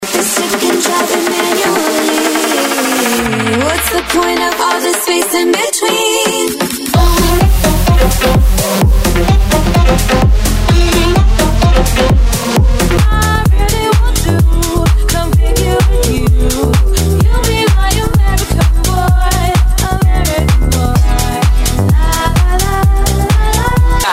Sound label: Pop Edm House Mash Up Megamix